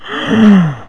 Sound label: pain